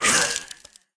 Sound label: plant troops damage, monster